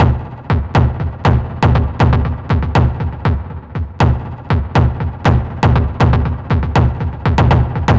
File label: Structured Rhythm, IDM Rhythms